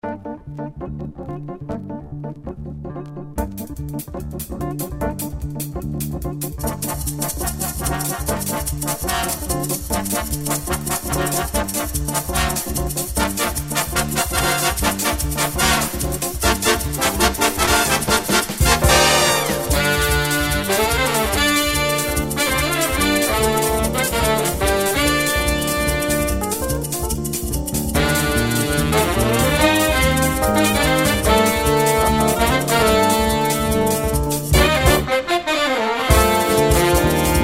Big Band Bad Samba; JAZZ

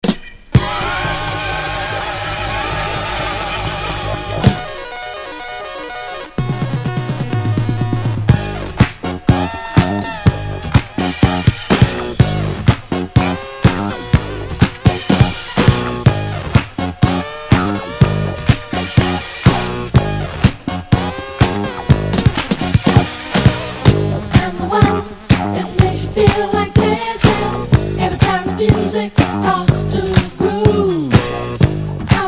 Disco

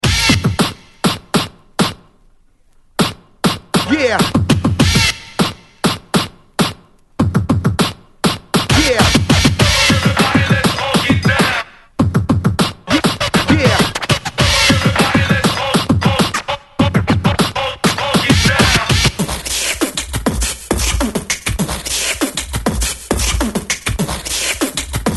Bass Line

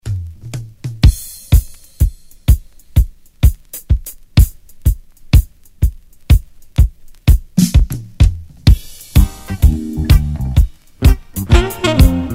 Sax

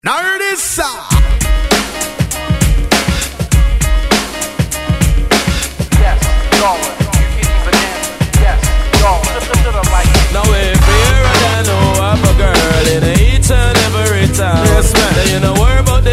REGGAE Hip Hop Ragga Mix

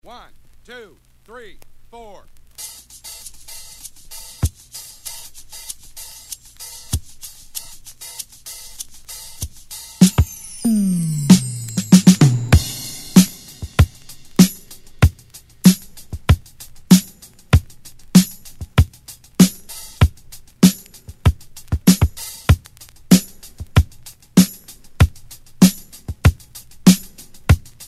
Drum Drops Funk Ballad

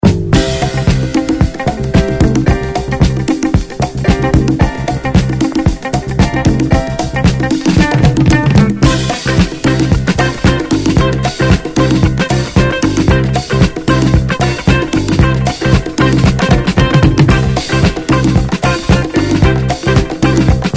DISCO

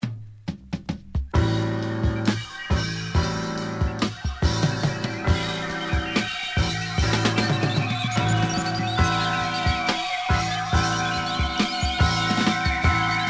JAZZ Instrumental

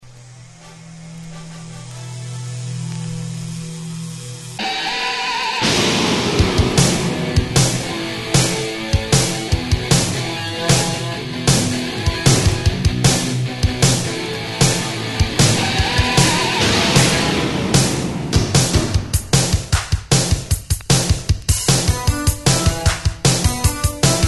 DISCO

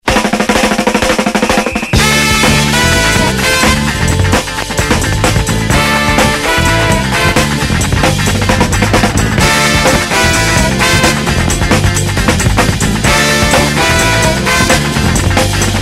Funk

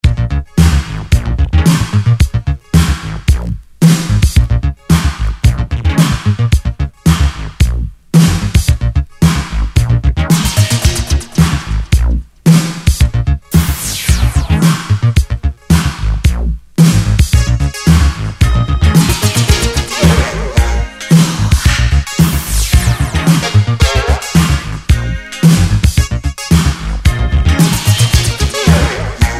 DISCO

